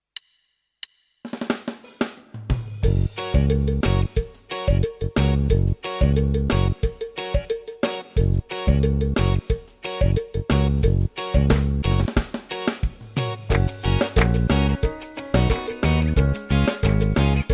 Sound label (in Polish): Hej, Pasterze! (WERSJA INSTRUMENTALNA)